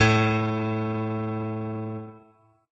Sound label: piano; pianojs